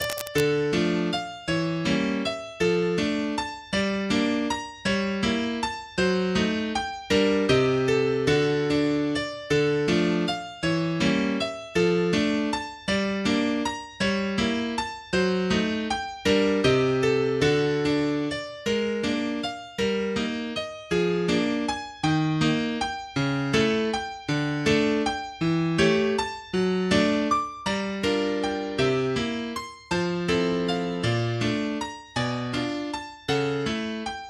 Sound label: Waltz